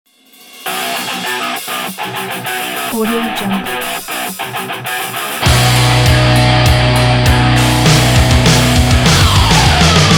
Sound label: this is hard rock